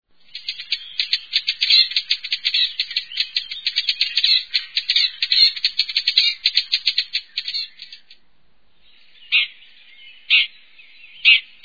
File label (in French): pie grieche ecorcheur, chants et cris